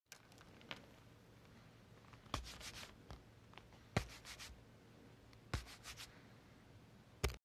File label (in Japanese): 財布